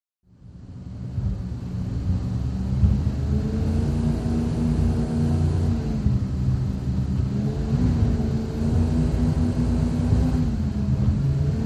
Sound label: Power Boat Int Racin, off shore power boat